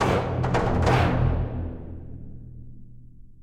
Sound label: victory